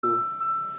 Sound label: Staff Assist Tone